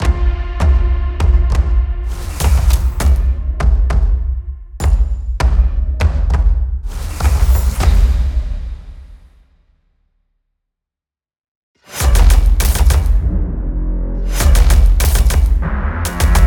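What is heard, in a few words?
disruptor percussive sound design; Trailer Xpressions IV; Sample Logic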